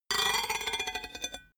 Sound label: metal rattle spin down; industrie wirtschaft; metall